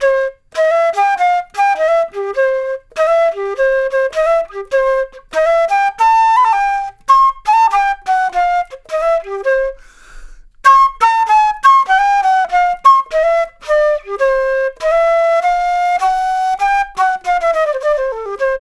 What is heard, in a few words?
Flute Solo, Jazz